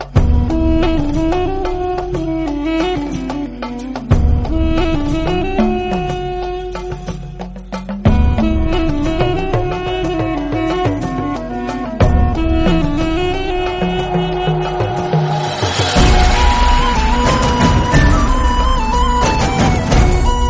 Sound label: Celebration Bgm Tamil; Aayirathil Oruvan; Karthi